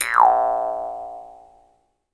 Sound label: Jaw Harps